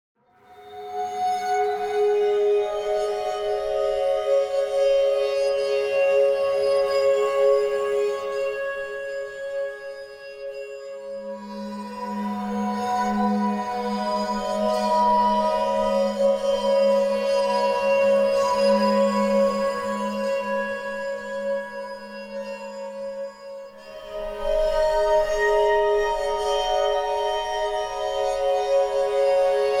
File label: waterphone; Muzyka Ilustracyjna